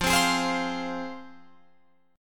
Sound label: F Minor F down Guitar Open F; strummed